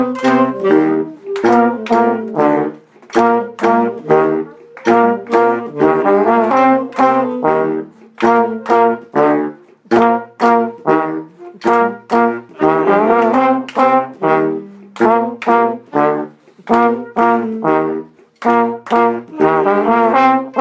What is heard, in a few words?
Basse, brass band